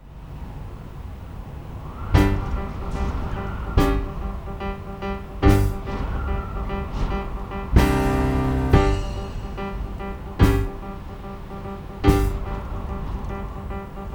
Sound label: storm